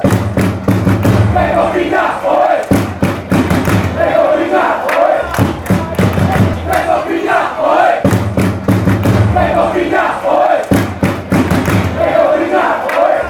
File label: ole, fanchants